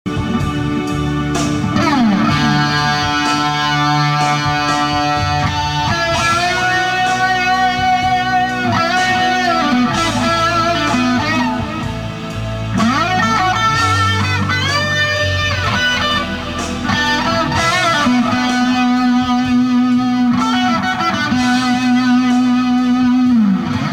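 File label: Seymour Duncan SSL5 pup with green Big Muff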